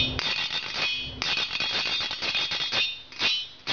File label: louisiana zydecco tie